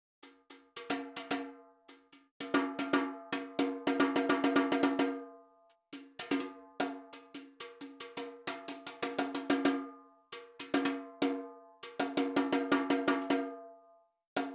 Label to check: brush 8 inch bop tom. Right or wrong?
Right